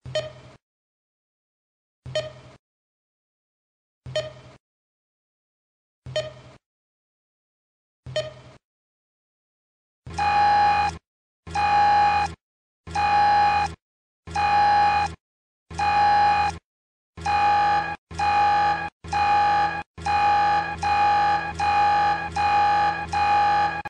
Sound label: Lost Beep Alert